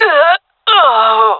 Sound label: death, fem; player vo jungle fem